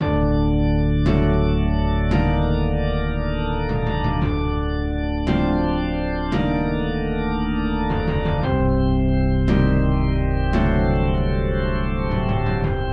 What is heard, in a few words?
Oberheim OB-3 Organ; Organux; Syntheway